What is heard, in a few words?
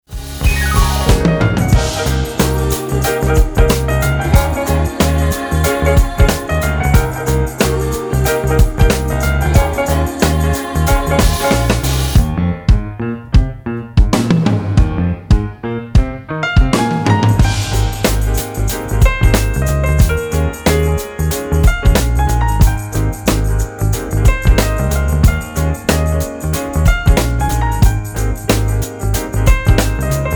Piano Mix; Piano Version